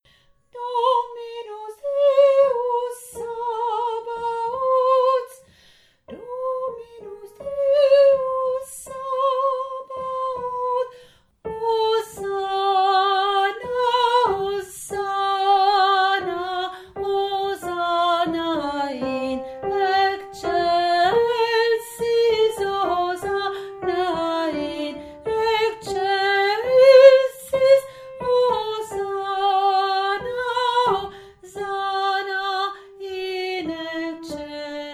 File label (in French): sanctus voix 2